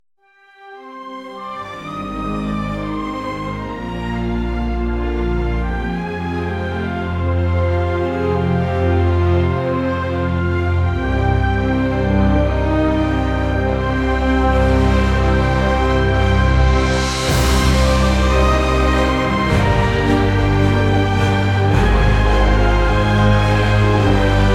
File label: calm